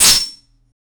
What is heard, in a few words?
hit sword for 2d game